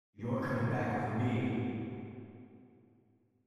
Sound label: Reverb; Age of Empires III; cinematics